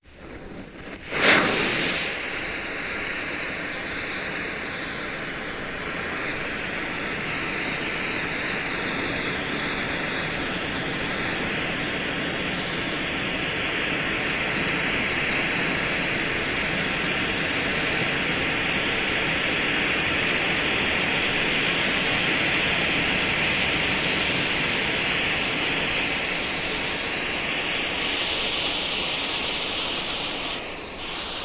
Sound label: Fuse Flare; Sound Ideas; Guns